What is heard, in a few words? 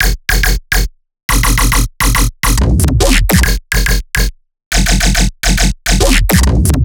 Riddim Bass Loop 140 F#; Dubstep; Riddim Basslines; Artillery; Ghosthack; Advent Calendar 2019 Day 17; Riddim Bass Loops